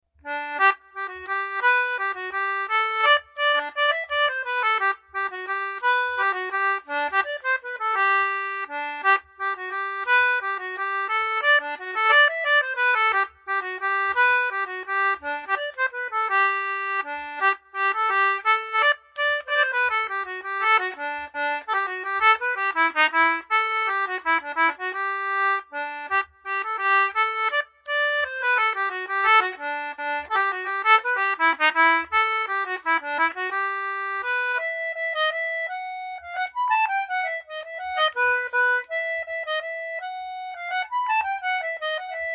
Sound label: concertina; gateshead